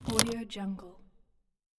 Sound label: car interior putting keys into ignition